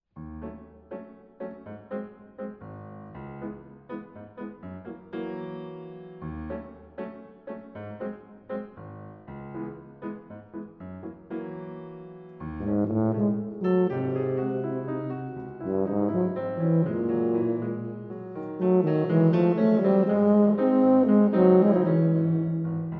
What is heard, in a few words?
Retratos for Tuba and Piano - Mvt. 2. Vals